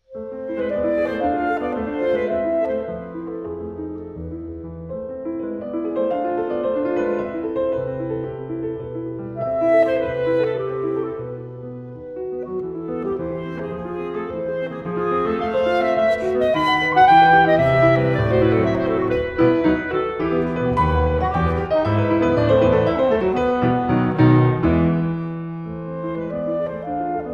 clarinet and piano; Mendelssohn; Lieder ohne Worte, op. 85; No. 2. Allegro agitato

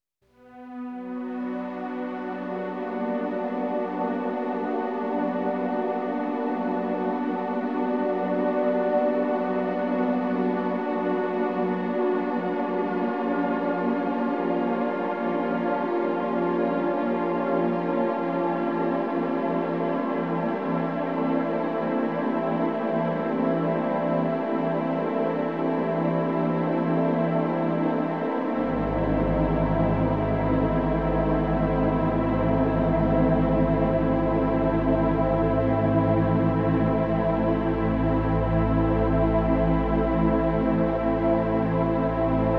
Gypsy Violin

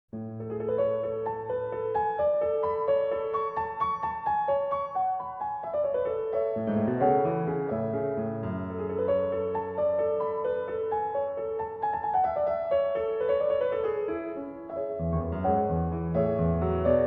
Prelude in F sharp minor, Piano

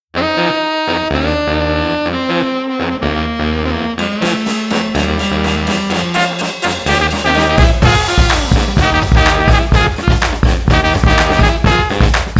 Horn Jam

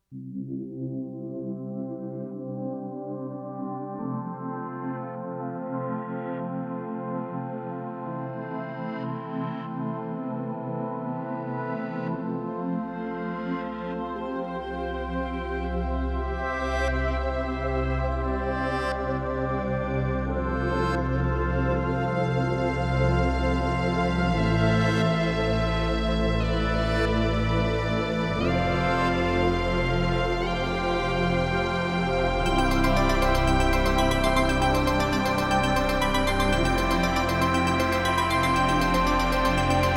instrumental

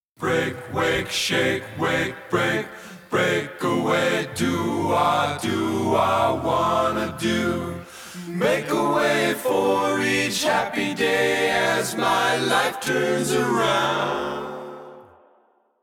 backing vocals

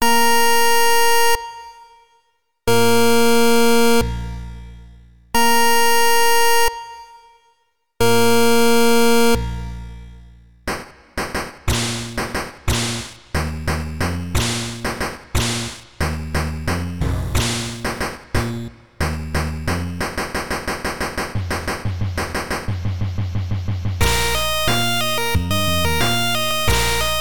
8-Bit Computer Game Cover Version

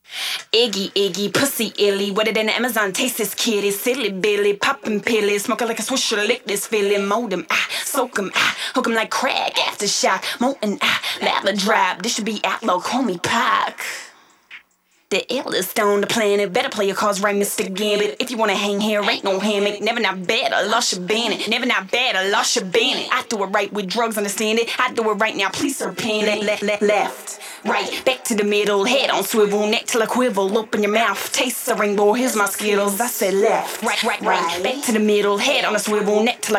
ACAPELLA 73bpm

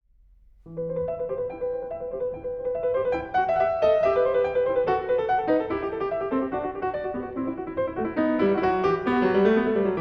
Piano; Le Coucou

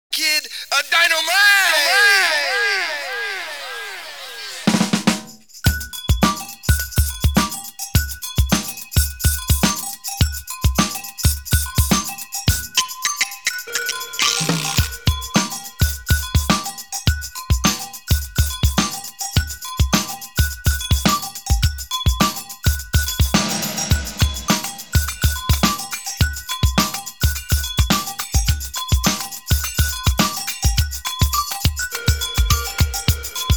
Dynamite Beats

